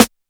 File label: snare